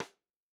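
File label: SNARE RIM SNARE RIM SHOT, analog recorded drum; A-CONSOLE; A-EQ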